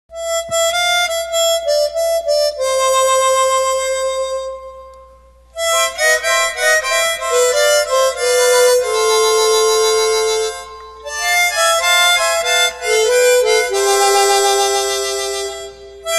Chromatic Harmonica

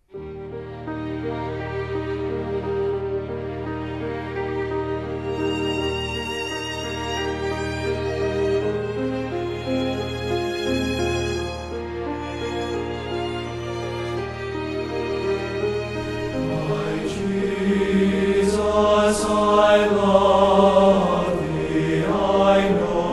Chorale With Orchestra, My Jesus, I Love Thee

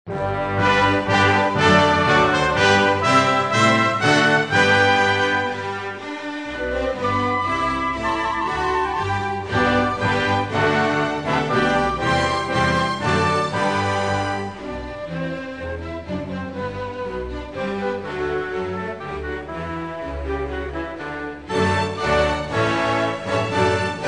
Messiah Highlights, strings

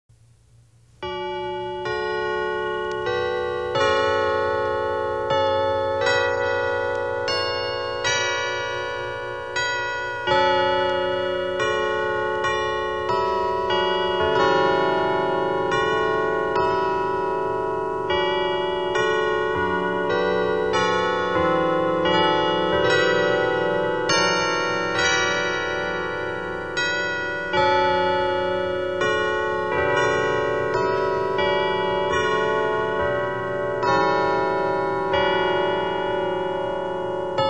carillon chimes; sweet hour of prayer

